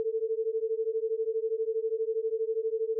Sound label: Autogenerated sine 120 cents